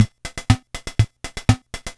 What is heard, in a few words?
Yamaha PSS-170 Disco